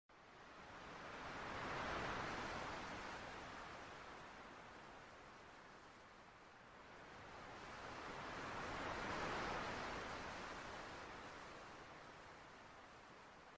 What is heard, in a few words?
ocean vibes